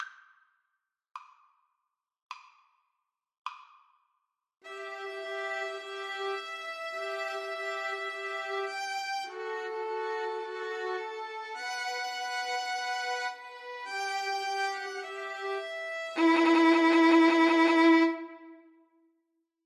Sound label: four seasons VLN TRIO